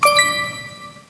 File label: blip notify; XDM; vox